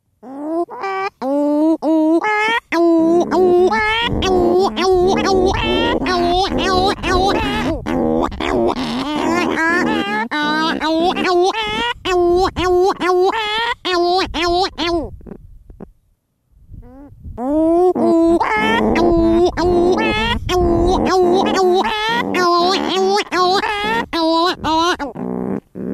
Cantos Cagarro Ninhos; aves marinhas

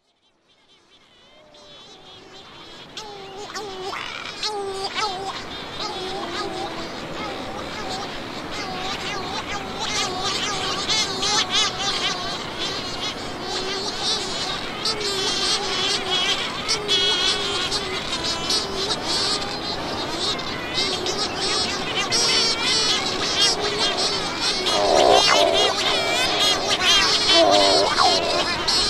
Cantos Cagarro Voo; aves marinhas

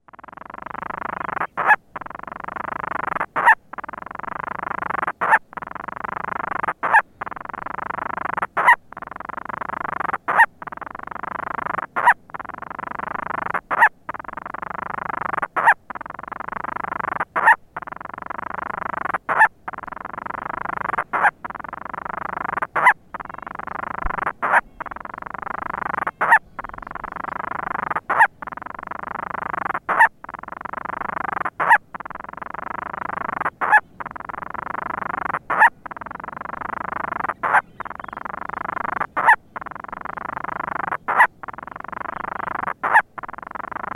Cantos Ninhos, painho monteiro; aves marinhas

